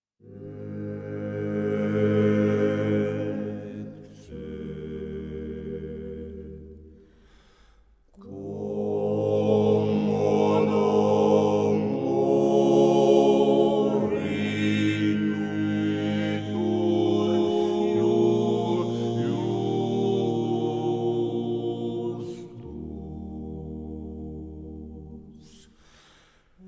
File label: acappella; Ecce